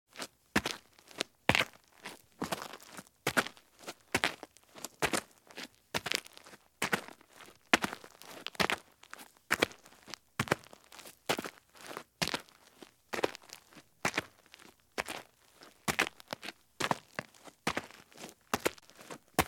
FOOTSTEPS OUTDOOR

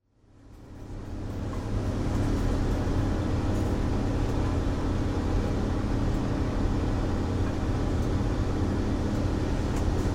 TRAIN LOCOMOTIVE

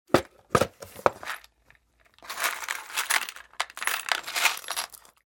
SCREW BOX

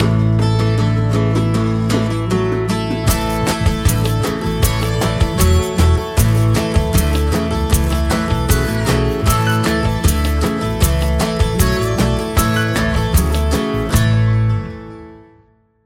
STING

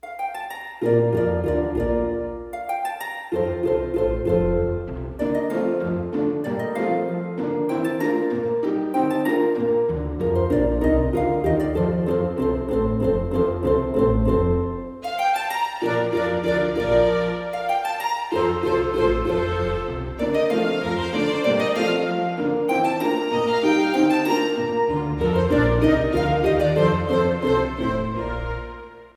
Harp Concerto in B Flat

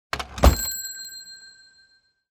DOOR WOOD

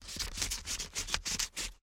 scissors cut paper

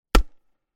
m203 launch

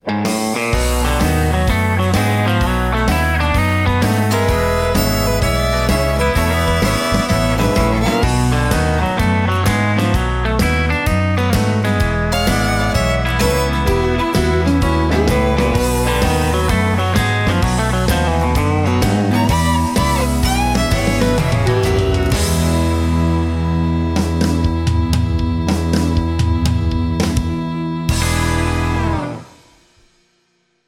Blues

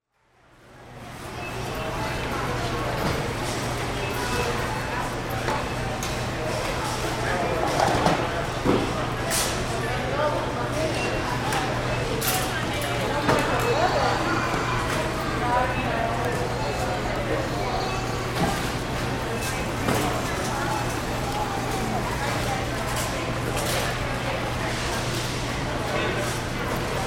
STORE WAREHOUSE AMBIENCE

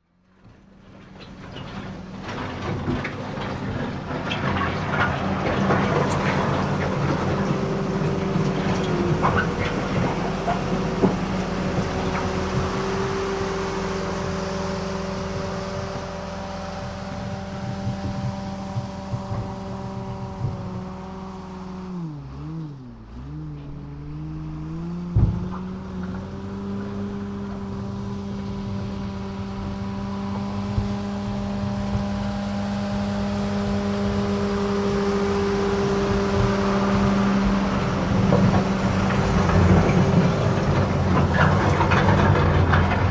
TRACTOR FRONT END LOADER

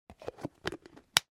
CONTAINER PLASTIC

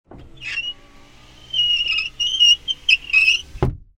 AUTO WINDOW

